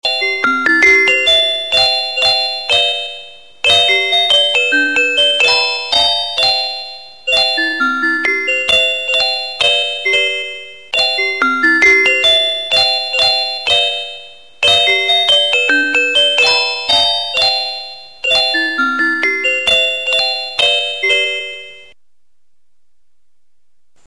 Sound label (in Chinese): 音乐铃声